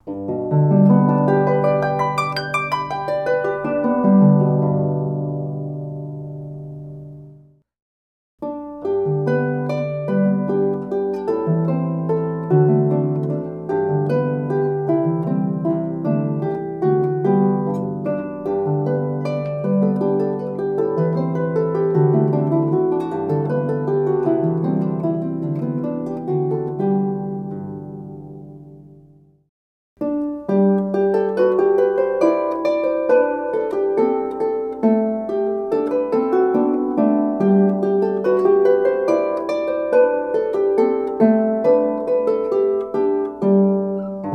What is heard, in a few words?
Harp 29 String; Lorraine